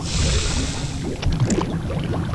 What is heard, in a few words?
BOILING; ICEBREAK